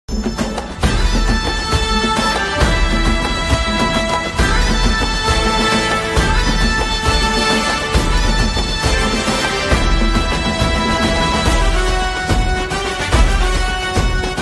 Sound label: war hindi lyrical bgm ringtone; Chiyaan Vikram